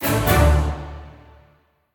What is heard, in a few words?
smallWin; netent; luckyangler